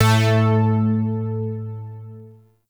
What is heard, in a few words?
Shawzin Oct One A; Music; Warframe; Zariman